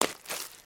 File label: Foot Mud Walk Light, footsteps